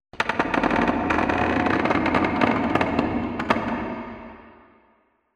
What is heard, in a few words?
wood creak low; Half Life Alyx